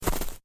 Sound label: bunny step; inlogic-bunnyquest